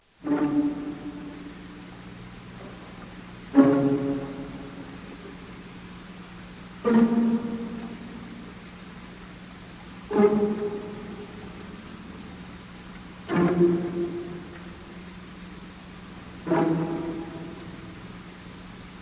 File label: first eight notes Webern